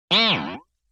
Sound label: Boing Box; Vintage Cartoon; Hits & Tones